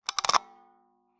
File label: Taxi Meter